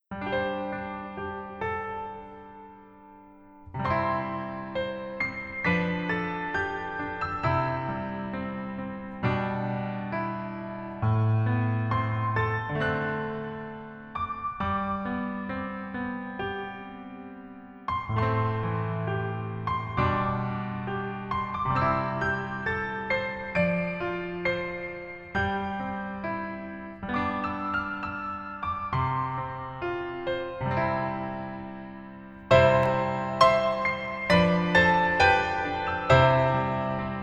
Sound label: Piano ver, 멜로디MR